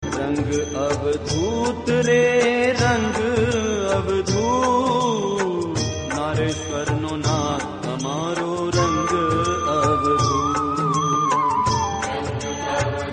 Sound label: gujarati song